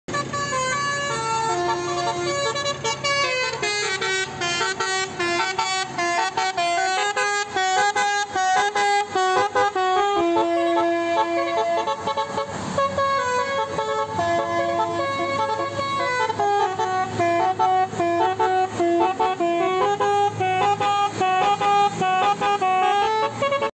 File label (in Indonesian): basuri